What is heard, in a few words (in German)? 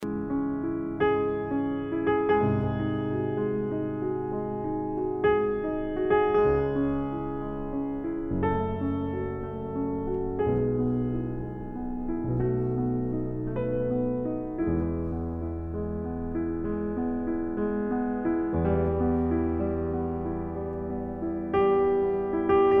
Adagio sostenuto aus Klaviersonate nr 14 cis-Moll; Ludwig van Beethoven; Adagio sostenuto aus Klaviersonate nr 14 cis-Moll op 27-2